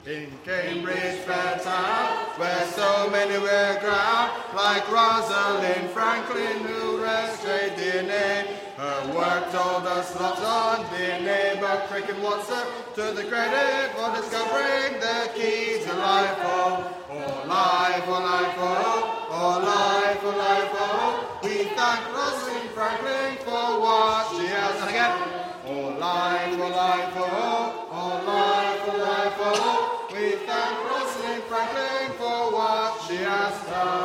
singing; singing history concert 2016

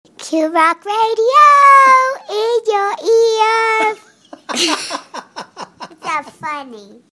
song helium jingle

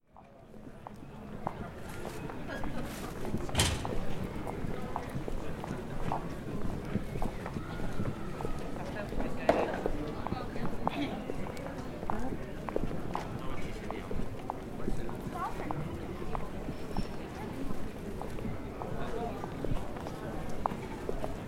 piazza ambience burano

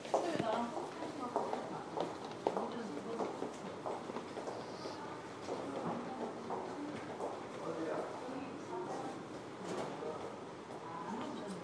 korean hospital ambient chatter

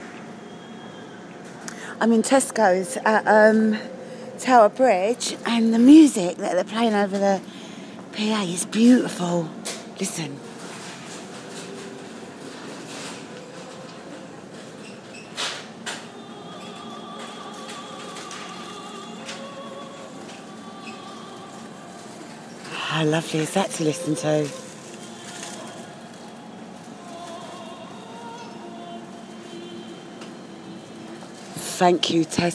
classical music in tesco metro; tooley street

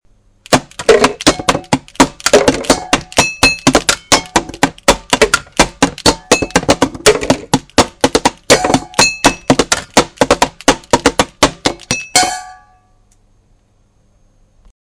lunchtime drum

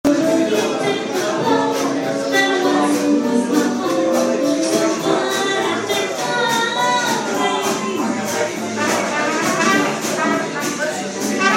jazz; rose crown

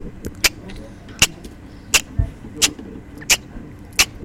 clock tick

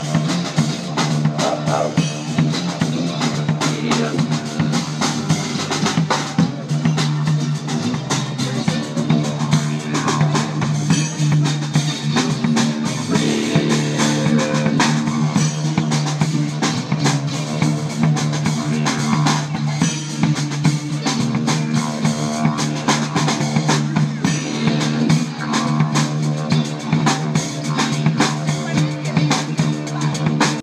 didgeridoo

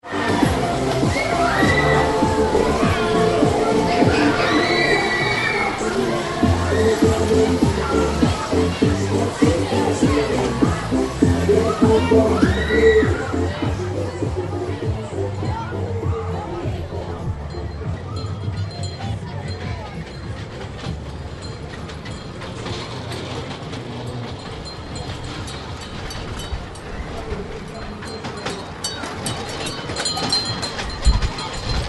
fairground sequence of 2 adjoining sounds

